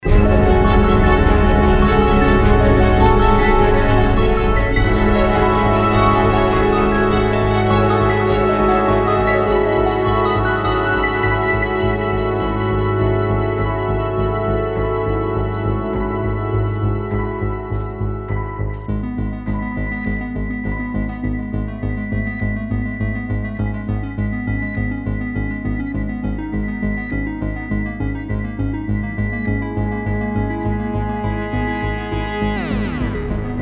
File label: Roboto Agitato; Offworld Trading Company